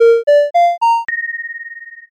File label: heal